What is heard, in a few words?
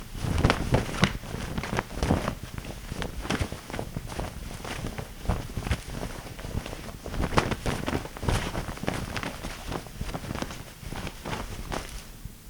Waving Flag